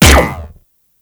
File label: Corpus UMP Shot